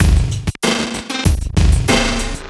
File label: CB LOOP; Zero-G; Chemical Beats